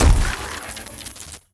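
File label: thunder, impact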